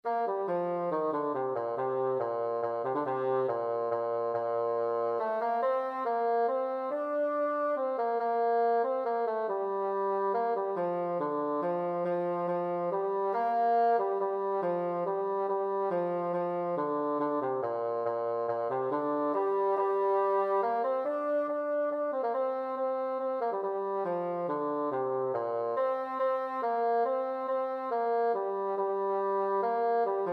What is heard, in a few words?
bassoon